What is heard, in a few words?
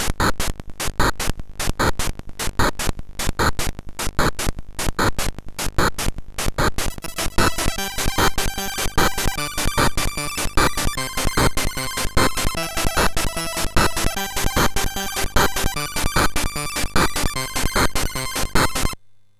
Tension Remix sid MOS6581R4